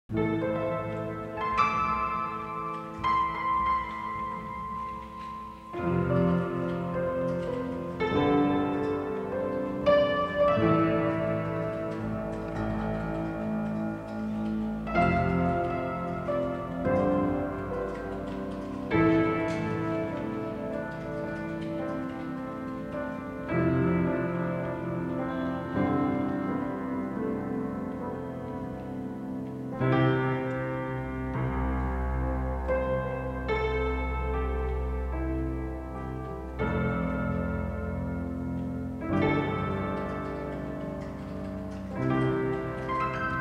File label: Old Piano